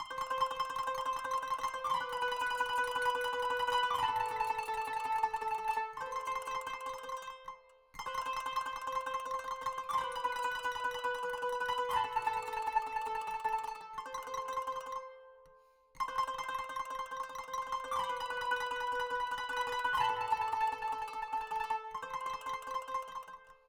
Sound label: kanun